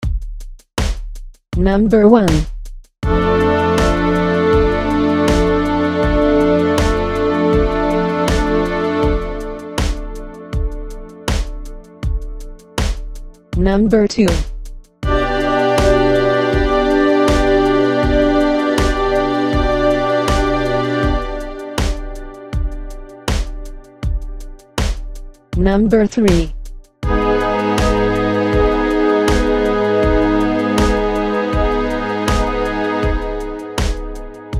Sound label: chord quality id; harmony